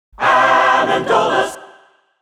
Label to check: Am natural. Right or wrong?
Wrong